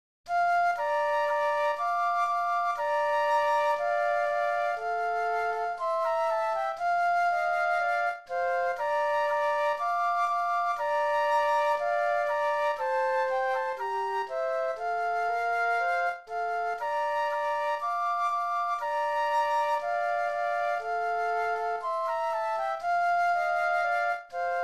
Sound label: flute duet; classical